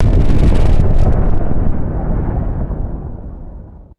soft distant muffled explosion boom; explosions

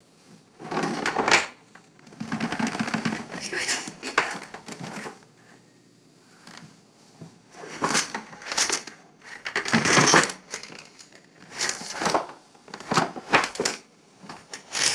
rummaging through a wooden drawer; drawers

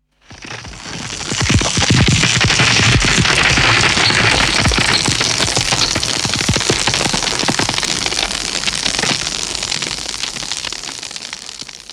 falling stones and rocky debris tumbling

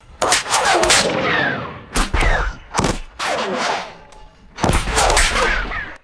rapid zippy bullet whizzes and ricochets

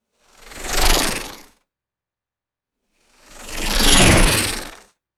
fluttery sci fi energy fly bys; magic

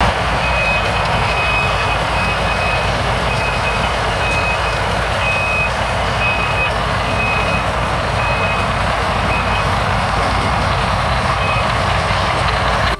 fire truck idling with cautionary beeps; vehicles

